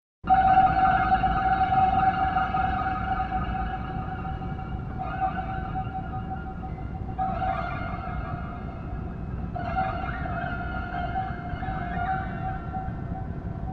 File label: Sworls, harp